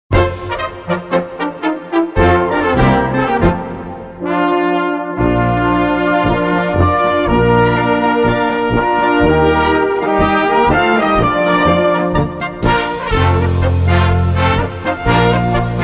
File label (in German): Heublumen Polka